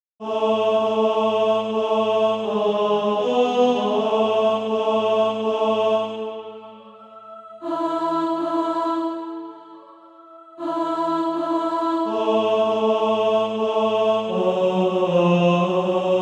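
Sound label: tenor, SATB Practice Music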